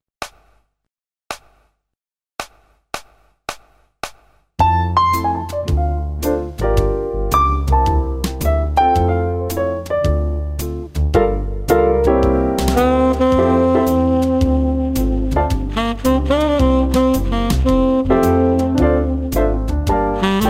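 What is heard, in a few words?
Jazz melody; MyVirtualBand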